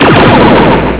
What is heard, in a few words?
laser blast; graal2001